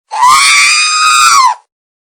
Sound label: scream, ringtones; Motorola; Droid 2 R2 D2